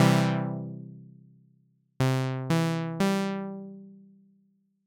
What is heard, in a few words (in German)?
arpeggiator c dur aufgeloest